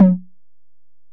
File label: Ac Perc, Drum Machines Alesis DM-PRO